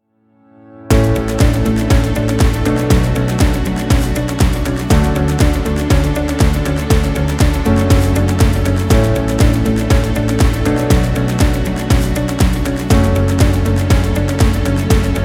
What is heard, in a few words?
Corporate Promo